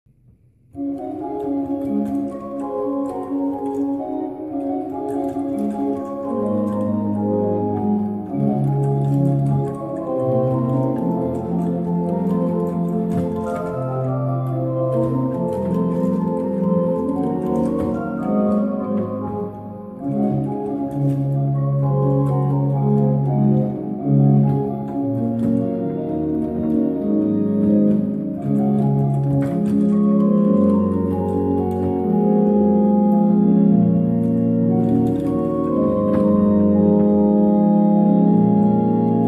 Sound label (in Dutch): orgel